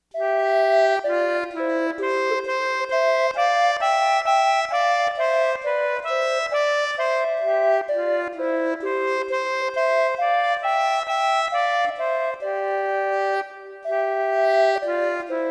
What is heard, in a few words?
musette